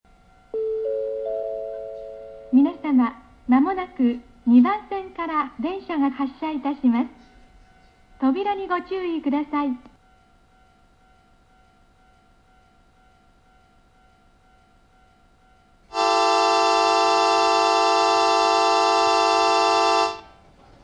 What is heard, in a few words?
bell; nankai; kawachinagano